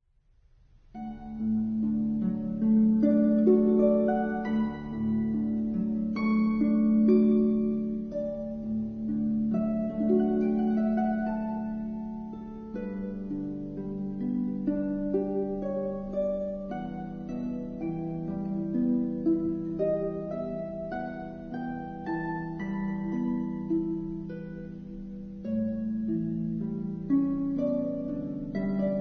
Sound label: Harp